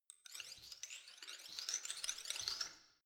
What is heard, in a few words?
organism settle short